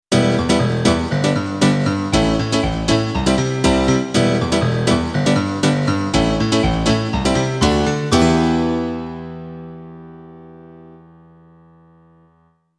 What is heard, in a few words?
dance piano 90s